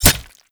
Kunai Impact Flesh; Warframe; Hit Effects; weapons

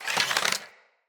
Bow Charge Power; Weapons; Player; Bows; Fey Thorn Bow

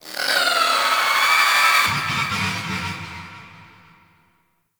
Sentient Lair Exterior Creature; Ambience; Misc Creatures; Warframe

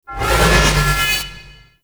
Charge Ability Charge Up; Enemies; Abilities; Warframe; Duviri; Dax Knight

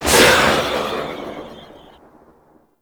Rifle Tail Outdoor; Zariman Grn Wraith

